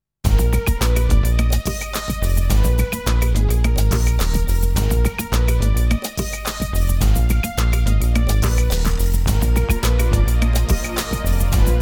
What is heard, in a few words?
Arabic